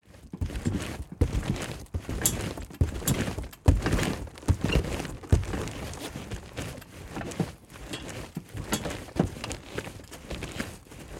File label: Jumping On A Trampoline